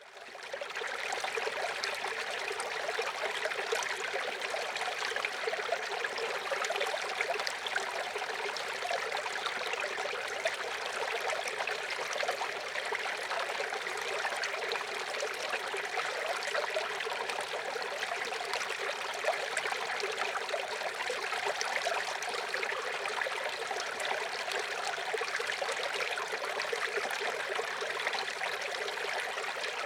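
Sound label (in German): BRUNNEN, Stadtgeräusche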